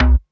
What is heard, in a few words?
PERC, Dance Mega Drums; Best Service; PERC-MIX